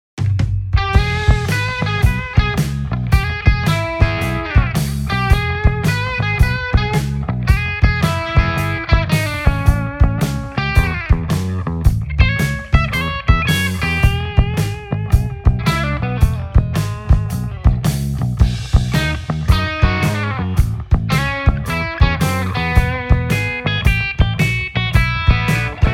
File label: blues; solo guitarra